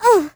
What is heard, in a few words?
hurt; Crypt of the NecroDancer